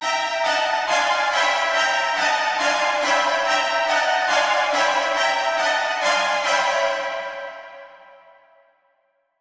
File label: Intense Strings High, horror strings